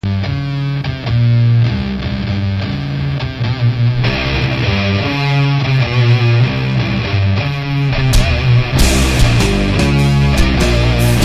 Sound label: electric tone; ELECTRIC AMP INNOVATIONS, LTD